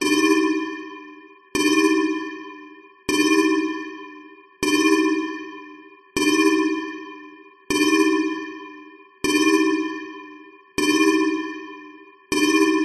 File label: science fiction alarm big warning meltdown beep large reverb medium